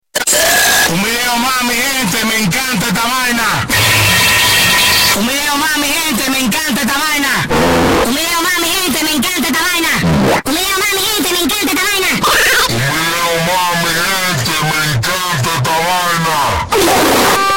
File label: goofy ahhh fart